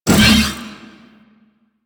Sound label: Big Slash Whoosh C; Crp Big Slash